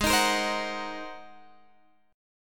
G sharp 9th, Guitar, Open G

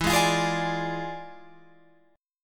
E 7th Flat 9th Guitar Open F, chord; E7b9; strummed

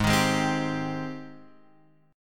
G sharp Major 7th G sharp

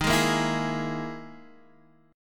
E Major 7th Flat 5th chord

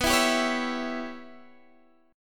C sharp Minor 7th Flat 5th B

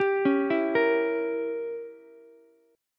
Db Diminished 7th, Ukulele; arpeggiated; 12,13,12,13

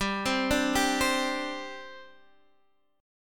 G Suspended 4th G down Guitar Half Step Down

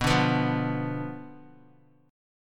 B Diminished B down Guitar; Bdim strummed

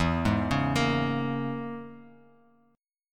E 6th Flat 5th Bass Standard, E6b5 chord; arpeggiated; JGuitar

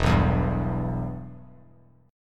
E-Minor, Bass Standard; strummed; JGuitar

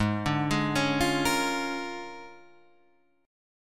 A sharp 7th Sharp 9th

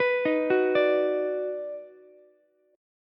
Eb Minor Major 7th Sharp 5th, down, Ukulele; arpeggiated; chord; JGuitar

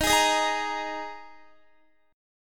D sharp Suspended 4th, Guitar; x,18,20,20,20,18; strummed

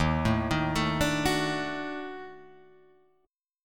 Eb Minor Major 7th Flat 5th, down, Guitar, Drop D

